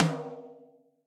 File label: Tom Mid Reso Classic; drum hits; Ableton; Factory Packs; Session Drums Club; Standard Hits